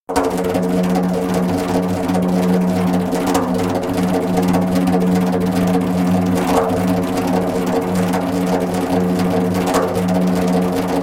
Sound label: Magical Spins (fast) spoken, Djembolale; Djembolale - Fills and Drills